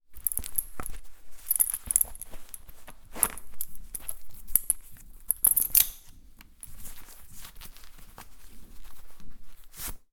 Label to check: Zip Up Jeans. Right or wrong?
Right